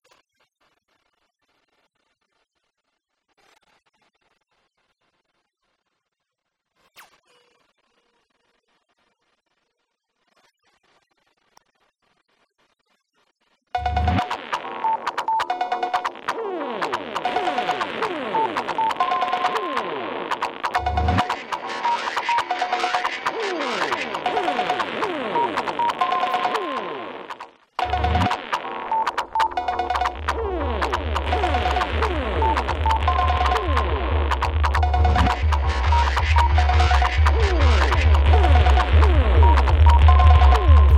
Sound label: Ocean